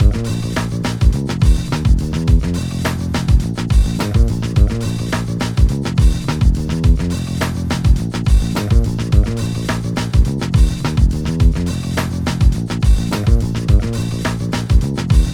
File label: Load Loop; NBA Street; GC; PS2